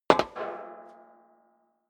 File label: step high heels metal rattle single right; schritte